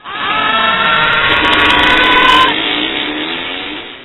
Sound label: dying soul